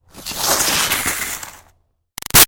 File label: Snow Slide By Icy Scrape Bumpy